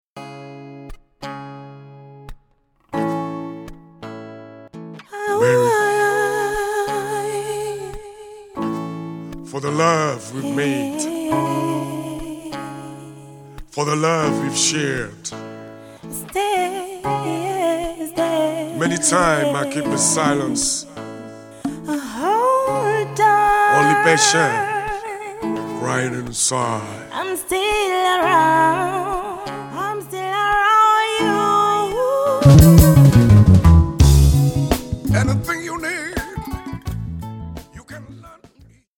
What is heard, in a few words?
kora